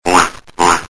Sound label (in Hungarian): kacsa